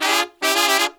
Pop & Funk Brass

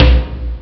m1 tom, Amiga Soundtracker Sample Packs; ST-XX Amiga Soundtracker Sample Packs

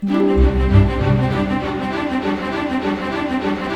BIKE; East West; Scoring Tools; ORC; Bike Ride C

